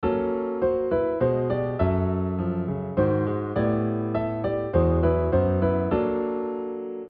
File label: polytonal example